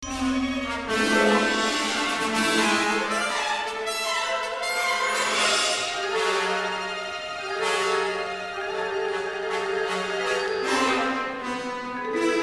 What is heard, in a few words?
Bathroom; Moaning Myrtle's Bathroom; Lego Harry Potter Year 1-2